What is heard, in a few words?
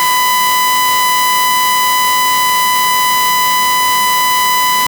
pulse stop